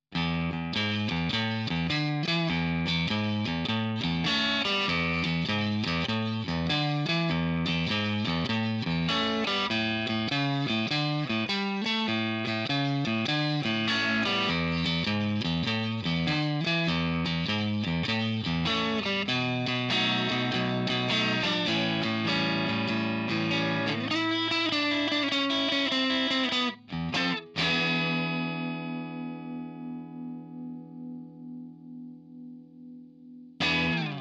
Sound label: Fender Neck Dirty; Gitarrenvergleich